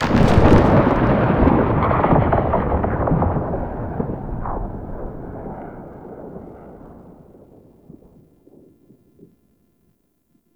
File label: Paratrooper Plane Fly Over Start; Warframe; Lasrian Soldiers